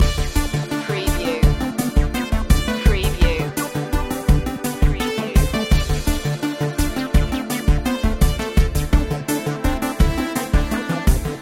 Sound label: astronavigator loop